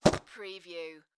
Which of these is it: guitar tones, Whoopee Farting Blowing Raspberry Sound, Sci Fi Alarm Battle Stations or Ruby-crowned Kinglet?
Whoopee Farting Blowing Raspberry Sound